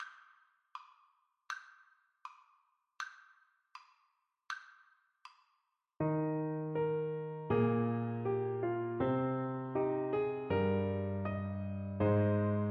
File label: Trumpet